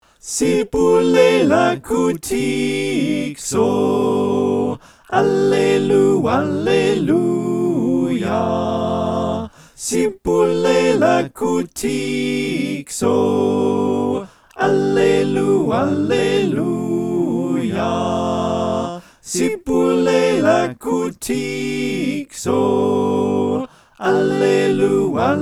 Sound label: SATB